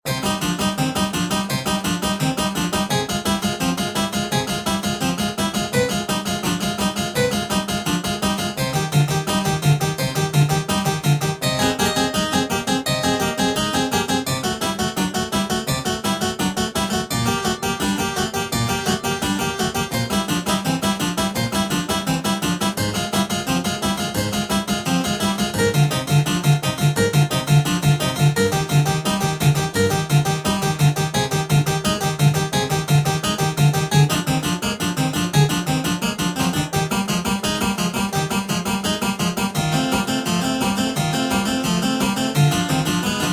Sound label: Prelude in C Minor; BWV847A; Bach